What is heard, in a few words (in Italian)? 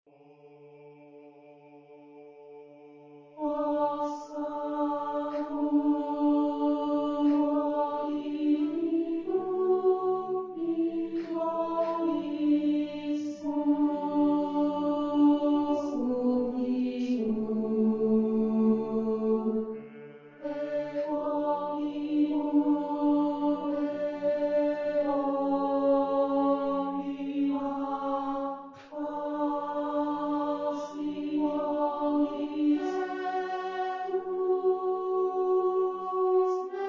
alti o sacrum; o sacrum convivium alti